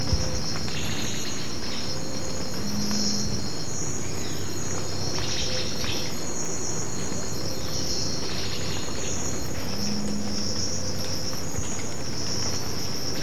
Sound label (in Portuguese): canto do anambe preto